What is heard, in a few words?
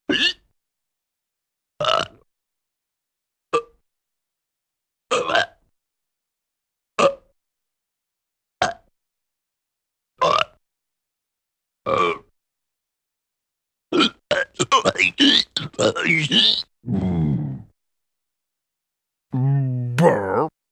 Various Short Burps Grunts Hiccups, Cartoon; Hollywood Edge; Cartoon Trax